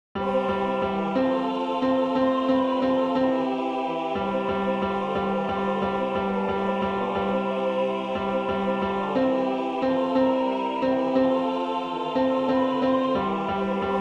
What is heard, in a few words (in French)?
Hymne au St. Laurent Refrains Tenor; Balade à Saint-Vallier